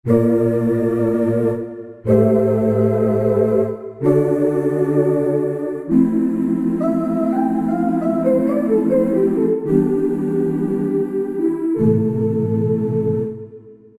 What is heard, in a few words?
Gospel, Yamaha PSR-3000 choir & pad; Factory Voices